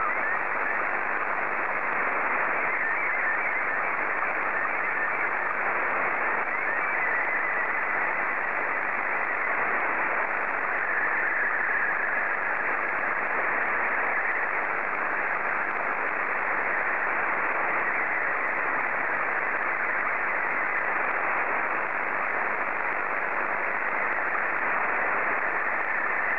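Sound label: SSTV, Scottie 1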